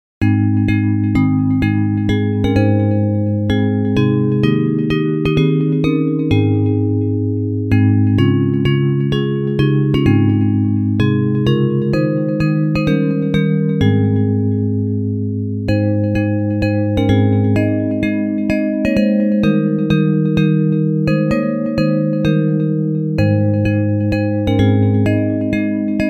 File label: Bells